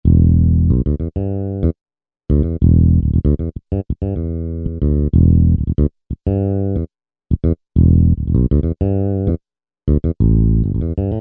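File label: Bass Stingray, Yamaha EX5R; Factory presets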